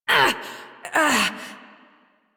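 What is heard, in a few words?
Pain, Adult, English; Warframe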